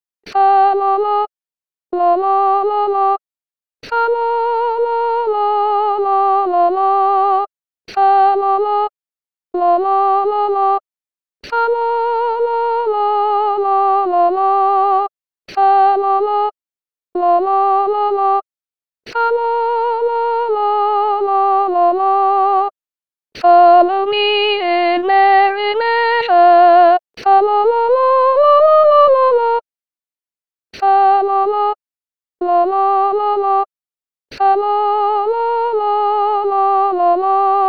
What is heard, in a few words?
Deck the Hall Soprane